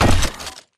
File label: footstep shuffle; Titanfall 2